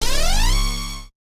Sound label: cast spells, magic spell; 魔法/法术的音效